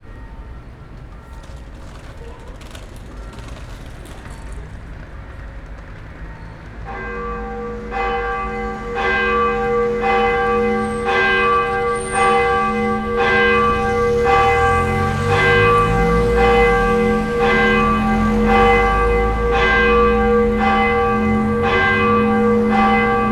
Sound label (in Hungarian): kassak lajos utcai, 10 orai mise kezdete, harangozas